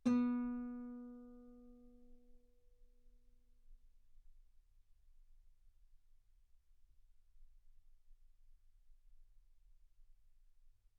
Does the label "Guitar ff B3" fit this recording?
Yes